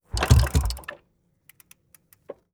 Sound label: Royal Enfield, Bullet, Kick, Single; Royal Enfield Classic 350; Bombay Sonic